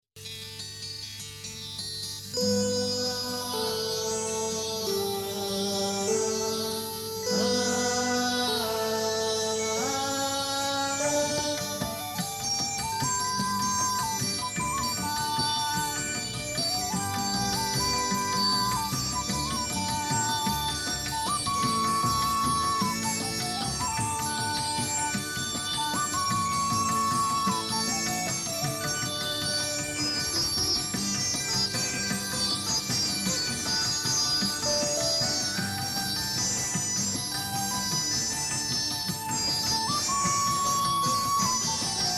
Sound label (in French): Orkestral